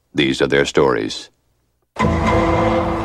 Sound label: DUN DUN